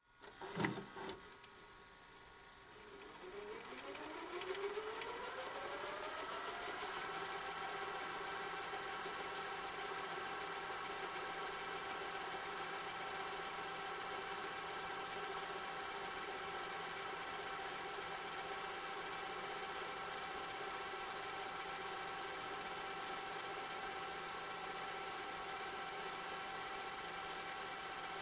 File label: VCR VHS Tape Fast Forward